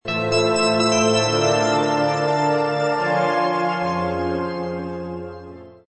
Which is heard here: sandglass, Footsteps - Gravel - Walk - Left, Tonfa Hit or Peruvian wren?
sandglass